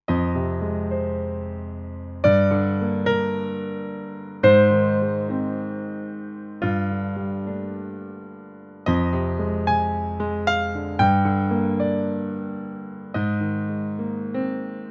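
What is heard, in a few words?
Kurzweil SP6 Intimate 7ft Piano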